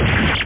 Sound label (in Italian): Sangue; MtDemo